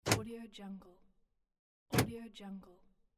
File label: car door lock